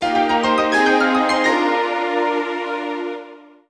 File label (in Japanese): ジングル：詩人